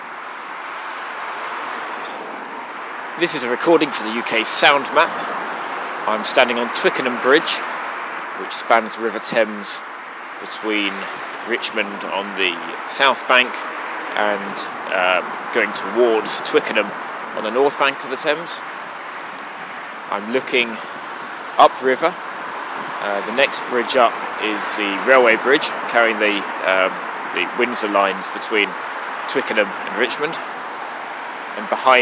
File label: on twickenham bridge; uksm